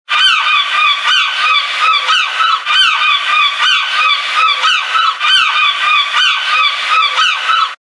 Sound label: Funky Mouettes; RingTones